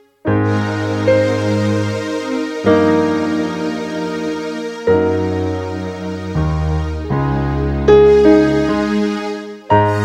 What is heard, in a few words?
winter love piano ringtone